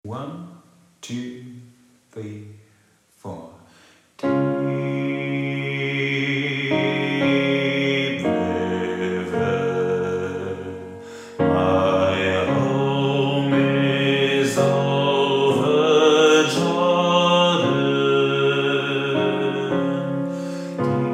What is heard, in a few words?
Deep River Bass; Popchor